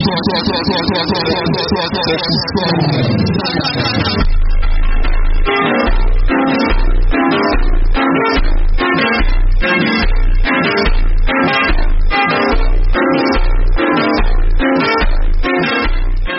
phonk; invincible

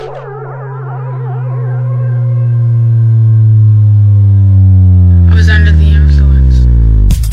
bass test

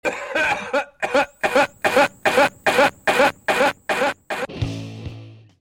cough cough

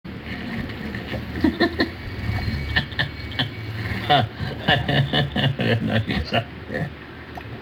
weird laugh

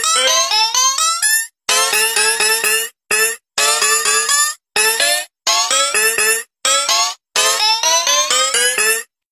scary

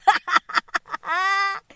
short single laugh

